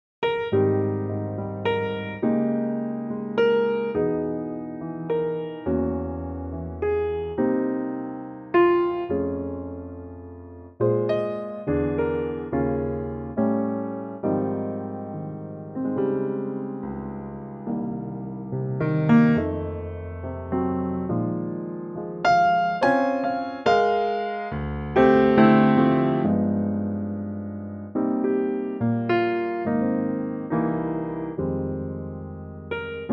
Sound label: Ab piano; Real Book